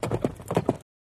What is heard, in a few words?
Horse Dbl Gallop